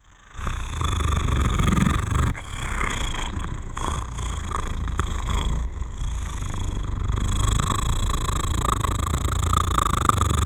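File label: velociraptor chirp and growl; animal communication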